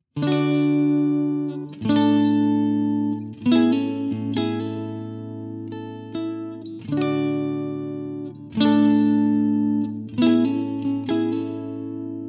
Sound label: clean; jensentone